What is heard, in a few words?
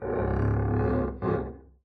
arco noise creak, double bass; dsmolken; noises